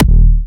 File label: Harder D#4, Kicks, Big Room Kicks